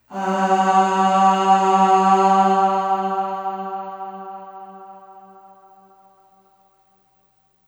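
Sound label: Aah Short G2 Long Reverb, Classical Choir; Harmonic Building Blocks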